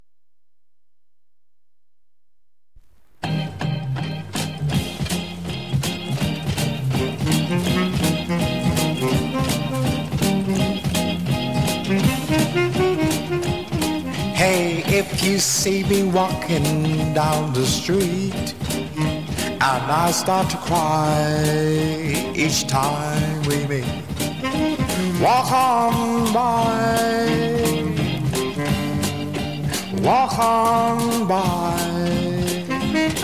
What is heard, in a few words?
trio